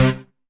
HOUSE, House Dance

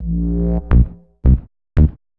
Bass, Futurist Drum & Bass

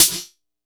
kit, Yamaha Motif-ES8

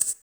RSS PERC

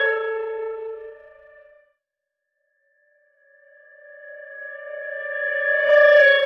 Clarinet Effect

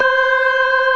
CLASSIC C3, Synth and Organ; InVision Interactive; Keith Emerson; The Most Dangerous Synth and Organ; CLASSIC C3 4

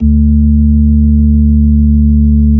B-3 Club Xfade, Keyboards of The 60's and 70's

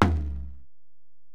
Ac Perc, Drum Machines Alesis DM-PRO

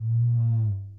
Box Drum; Sony CS

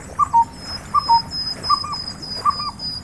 forest, nature; AKAI S6000 CD-ROM - Volume 6; Back Ground

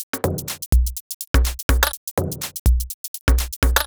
Top B 124bpm, House Drumloops; Zero-G House Fabrik Drumloops

